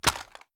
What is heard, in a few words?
reload drum end, weapons ar; viper